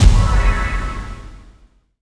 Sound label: Levitation